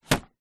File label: paper bag thick set down on table; foley; zapsplat